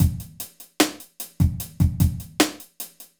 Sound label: hiphop